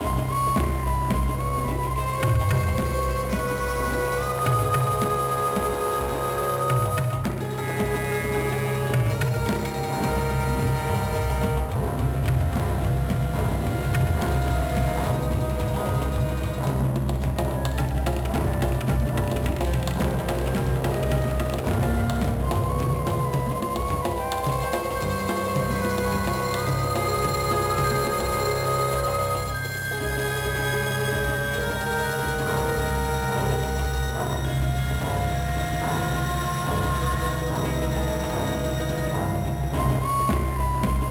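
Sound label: BGM combat huge loop; Avatar The Last Airbender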